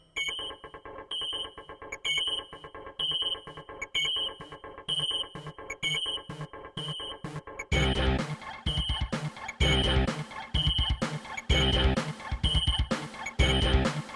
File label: Roland SC-8820; System Shock